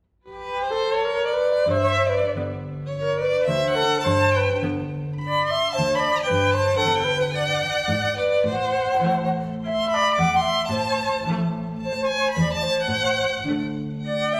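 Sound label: (Flute Trio); Cantilena South Florida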